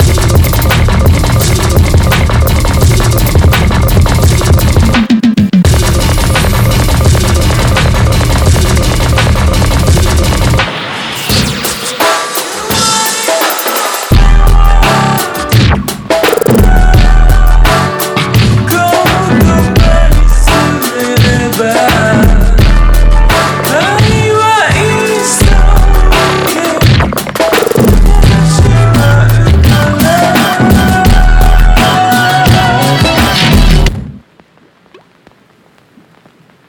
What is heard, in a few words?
BEAT BATTLE